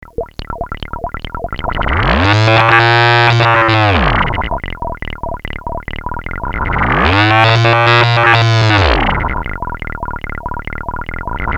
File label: NR Filter; Wiard; wiardgroup